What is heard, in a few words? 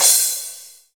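cymbal crash; Best Service Gigapack 2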